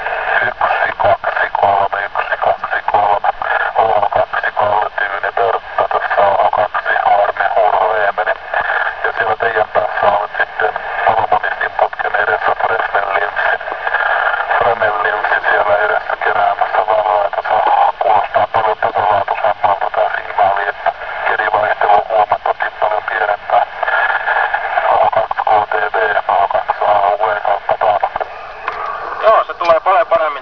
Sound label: laser ssb; malminkartano vuosaari